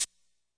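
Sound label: classic hh Shaker; Hip Hop; STYLE KITS; Classic Hip Hop Mega Kit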